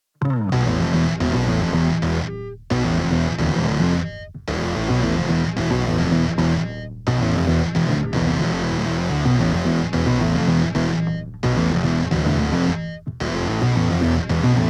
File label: Tropic of Cancer Basses 110